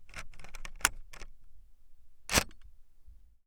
ARMEMENT-DECLENCHEMENT

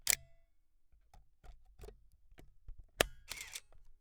INSERTION DOS FILM

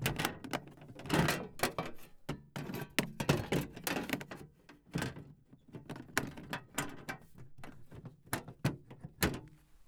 TRAPPE COTE DROIT OUVERTURE ET FERMETURE

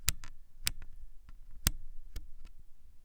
MODE DETECTION YEUX

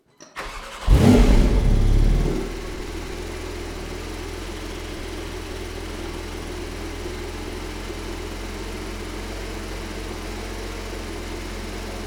DEMARRAGE MODE NORMAL

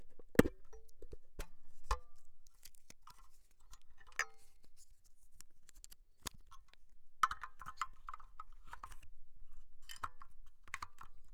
CHARGEMENT

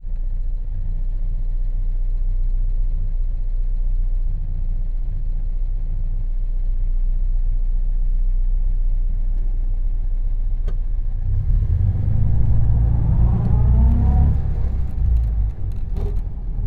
BINAURAL INT RALENTI ACCELERATION ET ARRET

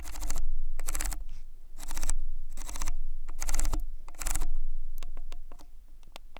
COLLIMATEUR AUTOFOCUS

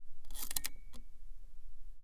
OBTURATEUR FERMETURE

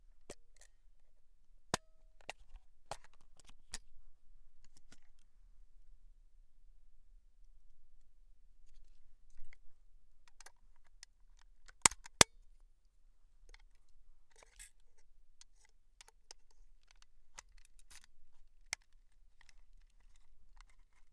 OUVERTURE CHARGEMENT FERMETURE MAMIYA RZ67

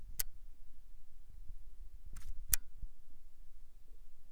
OUVERTURE-FERMETURE OBTURATEUR MODE-T

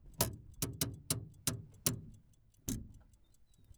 FREIN A MAIN

